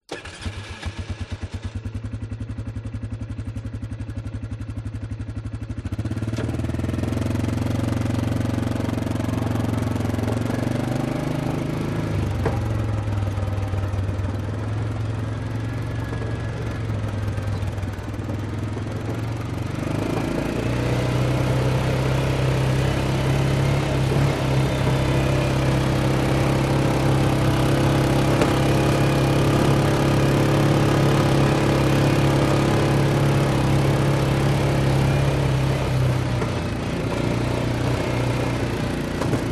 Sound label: All Terrain Vehicle; audio hero